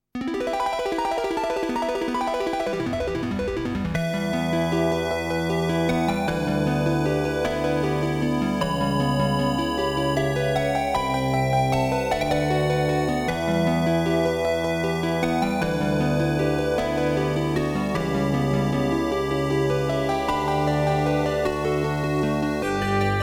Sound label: Harp; Sorcerian